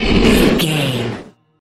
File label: troll monster breath